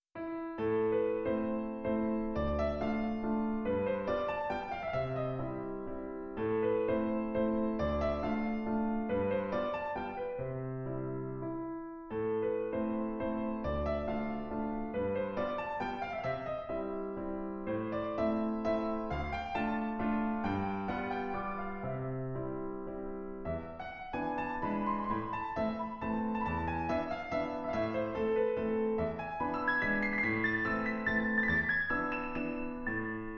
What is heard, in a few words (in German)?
Walzer a moll; Chopin; KKIV 11